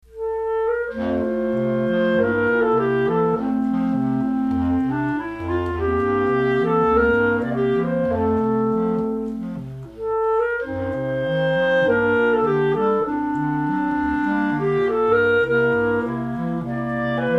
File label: Sonata Piano; Schubert